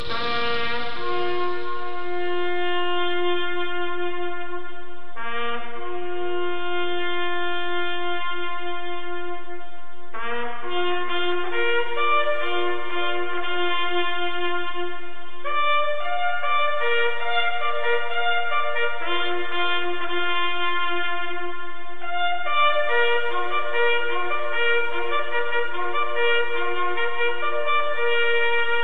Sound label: bugle last post